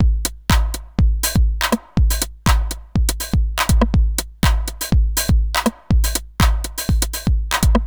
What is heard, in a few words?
Dance Drumloop; Drums; Dance Beats